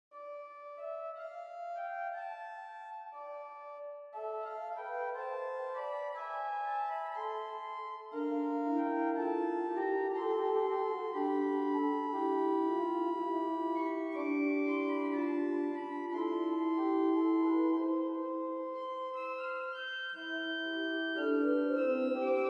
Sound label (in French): Orgue en trio; Souvigny; Organ Music; Couperin Louis; Solis ortus cardine